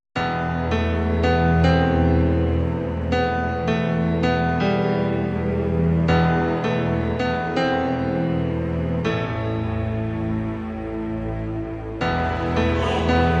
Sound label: underscore